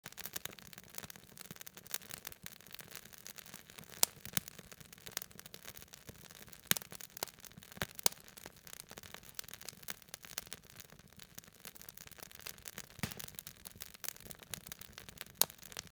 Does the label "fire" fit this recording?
Yes